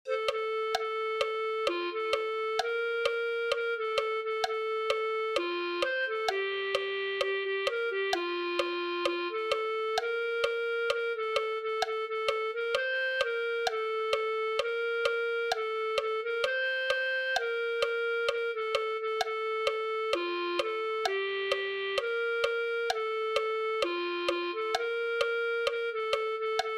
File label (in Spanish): tenemos ciudad fuerte clarinete